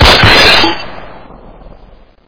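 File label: shot at a glass target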